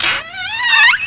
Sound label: door; outlaws